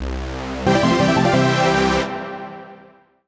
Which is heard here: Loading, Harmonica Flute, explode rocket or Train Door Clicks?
Loading